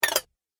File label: Droid Down Periscope, robot sound effects; An Essential Sci-Fi Robot Sfx; Andrew Fleming